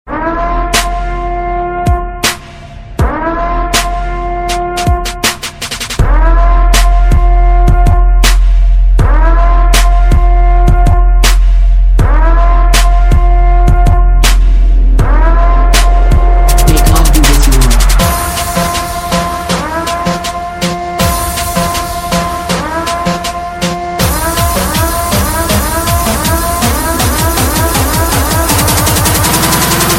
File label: Purge Siren Dubstep